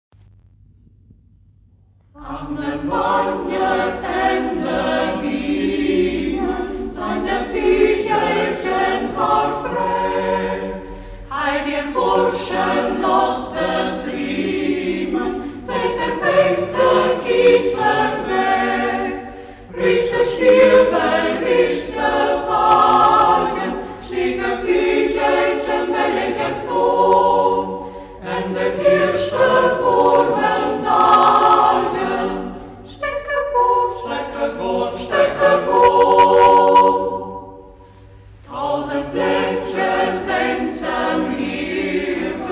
kammerchor; Ortsmundart: Schäßburg; Schäßburger Kammerchor; Hegt wird gesangen

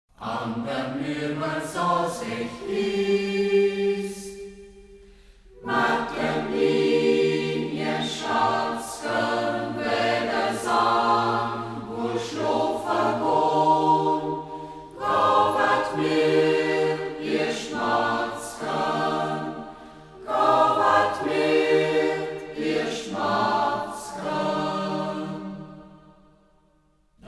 Reußmarkter Chor; Ortsmundart: Reußmarkt; Angderm Lirber såß ech iest; Hegt wird gesangen

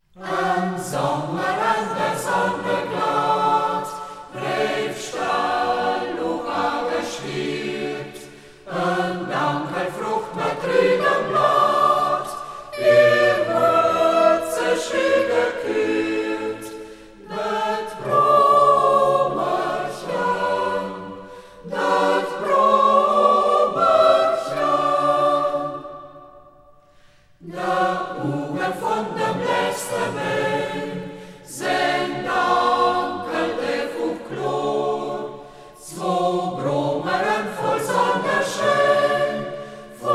chor; Ortsmundart: Reußmarkt; Reußmarkter Chor; Hegt wird gesangen

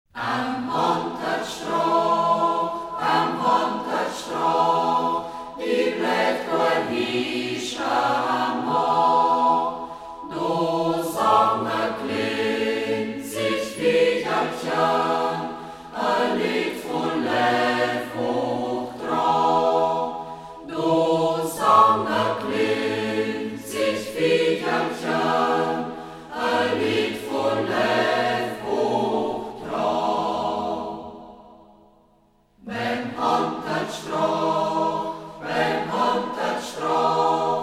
Reußmarkter Chor, Ortsmundart: Reußmarkt; Äm Honterstreoch; Hermann Kirchner (Melodie); Hegt wird gesangen

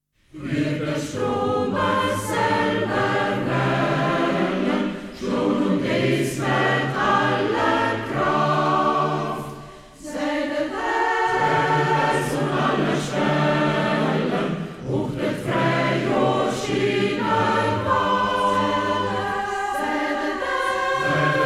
Liedertafel; Ortsmundart: Hermannstadt; Heltauer Liedertafel; Hegt wird gesangen

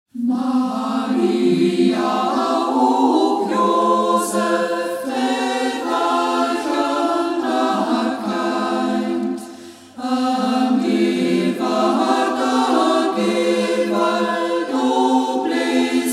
Chor; Ortsmundart: Reußmarkt; Maria uch Josef; e sachsesch Chraestlied; Reußmarkter Chor; Hegt wird gesangen

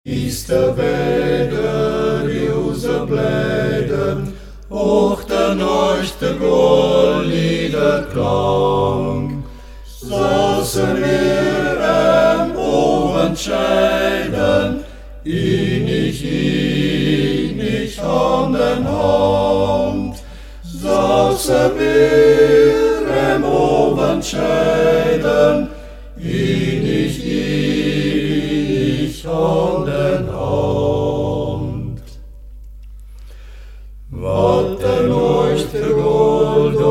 Mediascher Oktett; Ortsmundart: Mediasch; Wießt tea, wä de Riuse blähden; Hegt wird gesangen

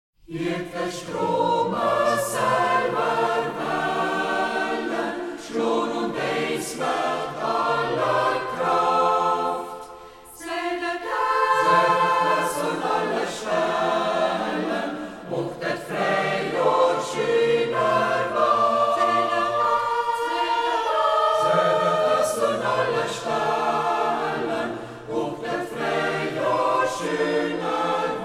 Reußmarkter Chor; Ortsmundart: Reußmarkt; Hirt, des Stromes Sälwerwällen (Det Frähjohr); Hans Mild (Melodie); Hegt wird gesangen

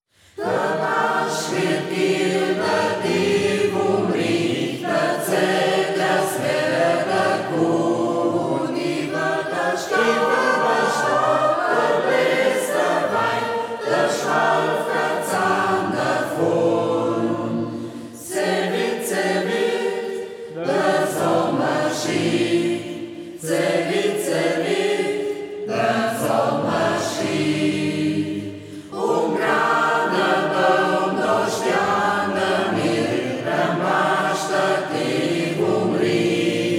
singkreis; Ortsmundart: Braller; Singkreis Kampestweinkel; Hegt wird gesangen

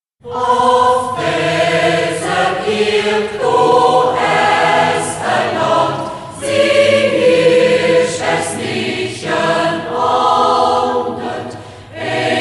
kammerchor; Ortsmundart: Schäßburg; Af deser Iërd; Schäßburger Kammerchor; Hegt wird gesangen

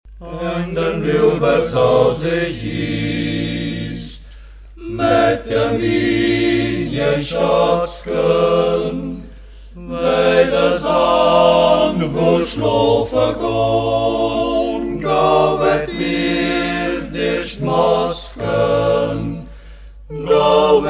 oktett; Ortsmundart: Mediasch; Angderm Lirber såß ech iest; Mediascher Oktett; Hegt wird gesangen

